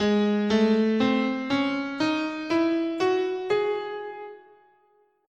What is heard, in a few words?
Ab Phrygian Dominant, scale